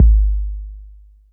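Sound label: bass drum 808 Lo Color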